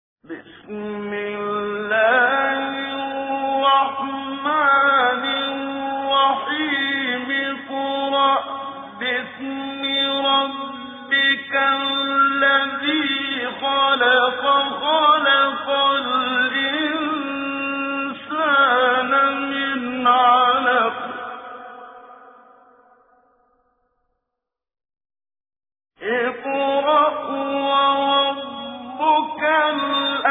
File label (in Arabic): سورة العلق, تجويد; المقريء الشيخ محمد صديق المنشاوي